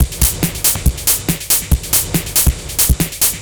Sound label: Noise Beat; Drums & Drones